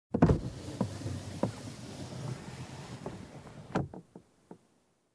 Manual car window downlift

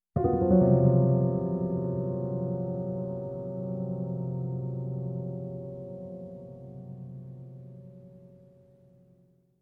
Horror Ambience Scene Piano

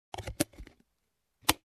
Filling in and turning on

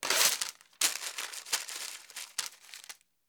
Paper torn and crumble

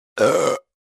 Burping

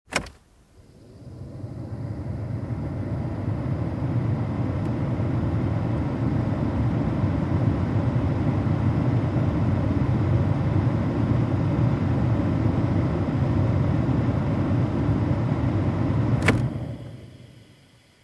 Turning on air condition in car

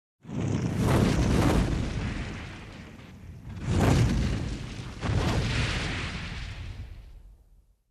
Mutliple Fire Wooshs

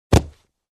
Boxes being dropped bang sound

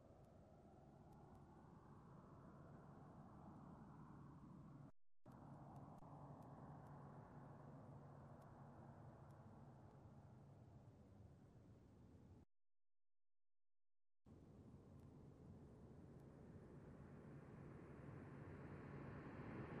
Car passing by fast

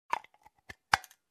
Opening and closing small box

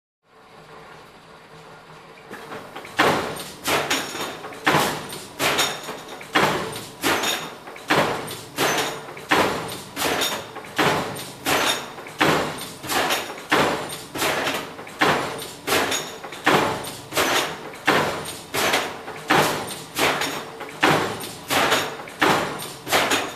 Industrial Stamping Press Machine

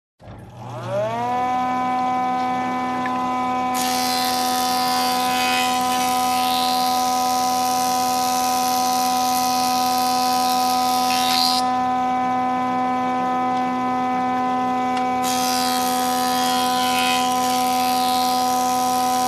Construction

